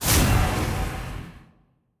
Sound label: fs hero take damage; arcane